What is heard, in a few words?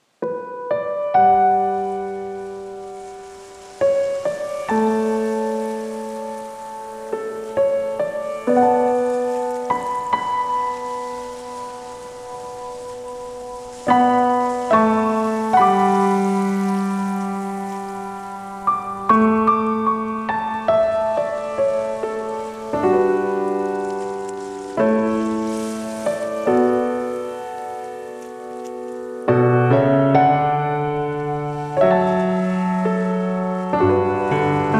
Instrumental